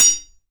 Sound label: IRONWARE, IRON WARE HIT; AKAI S6000 CD-ROM - Volume 6